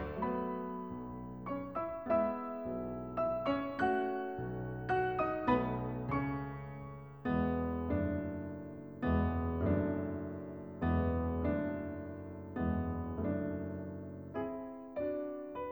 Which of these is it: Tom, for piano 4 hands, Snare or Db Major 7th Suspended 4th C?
for piano 4 hands